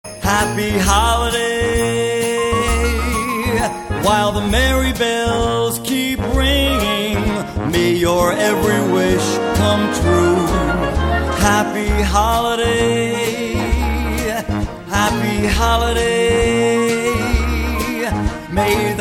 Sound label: happy holiday song